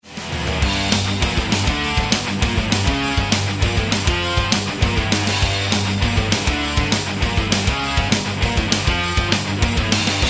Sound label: metal